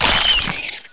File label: death; Hexen 2; spider